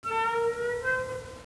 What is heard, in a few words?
door creak